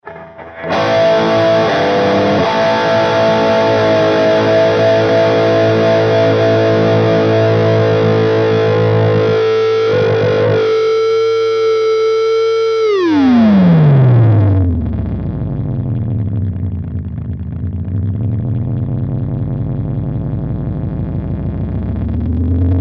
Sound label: delay wacky